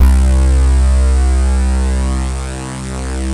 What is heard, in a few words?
Bass Note C